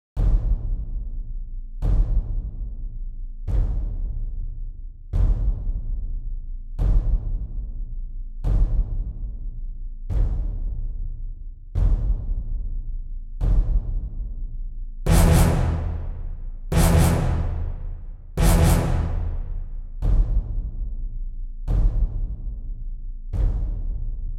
Percussion Layer; ProScores

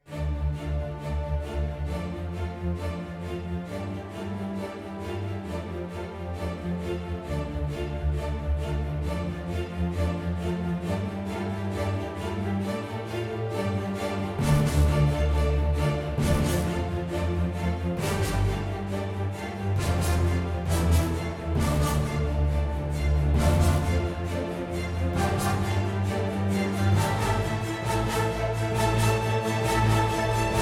Orchestra; ProScores

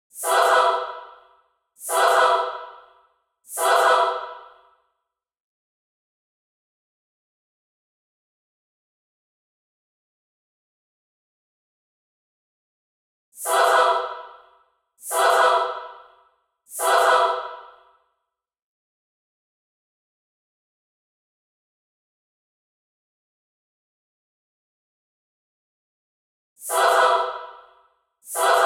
Choirs Layer; ProScores

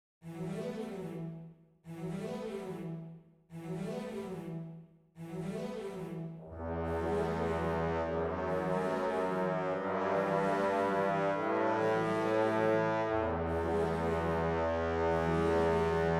String Brass Layer; ProScores

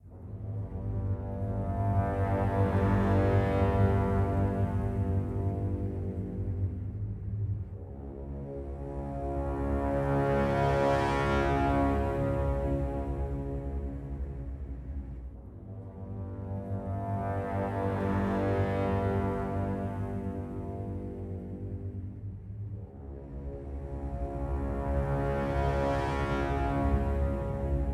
Orchestra; ProScores

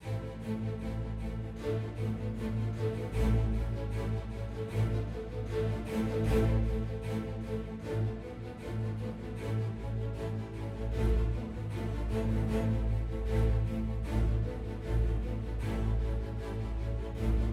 Strings Brass Layer; ProScores

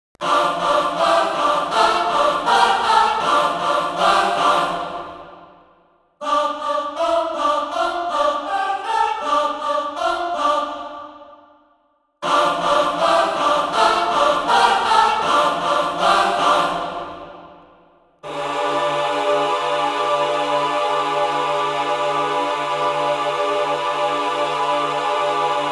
Choirs; ProScores

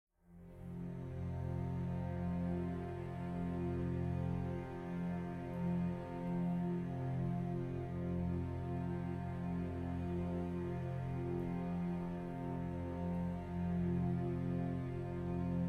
Orchestra; ProScores

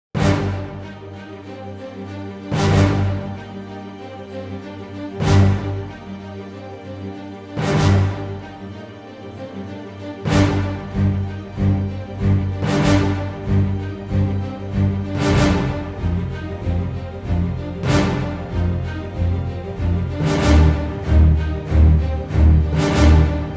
Strings & Brass; ProScores

